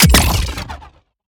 Shot Small Blaster Pure Fusion

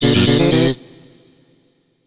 Collect Empty Honeycomb; Banjo-Tooie; N64

